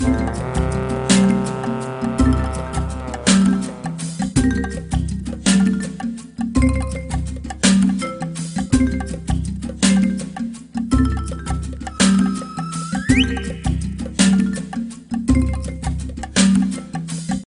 Jungle Level

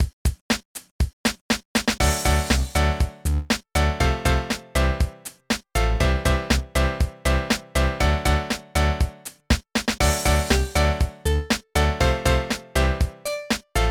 Stereo Pop

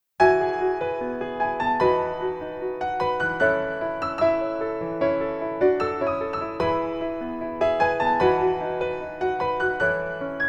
Piano; Harenochi Kitto Nanohana Biyori

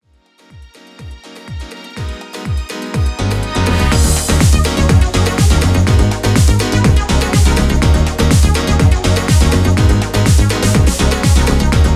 strings; SORR Bonus and Extended; streets of rage remake

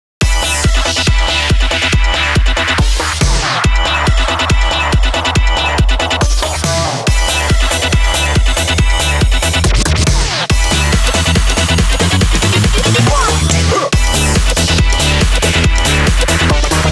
Lo-Fi; M-SECA

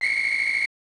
Whistle

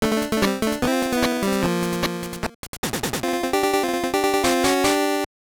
Jingle, gb; Play Action Football

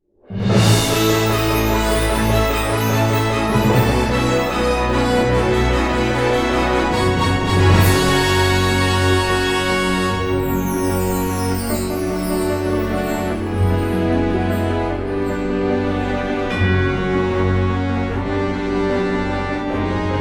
(Jingle) Final Boss Gate Apperance; balan wonderworld

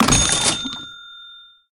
Sound Bonus Buy; Peace, Death

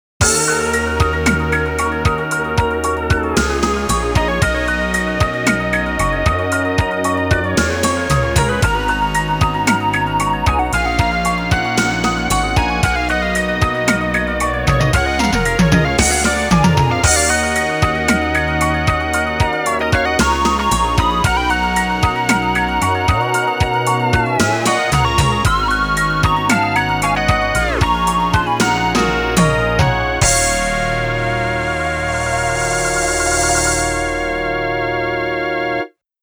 Mission Failed; arcade disc in jaleco shooting

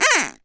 Hit Duck

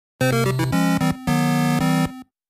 Miss

